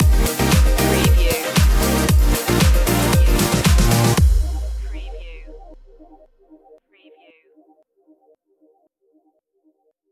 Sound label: start up groovin stinger